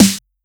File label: Snare Fuse, 909 Snares; Slate; Vintage Drum Machines Volume 3 909 Edition